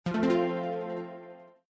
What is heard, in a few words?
Wins Highlighted